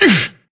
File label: grunt hits; Titanfall 2